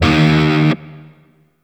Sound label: Shawzin Oct One D Sharp; DE; Warframe; Grineer Shawzin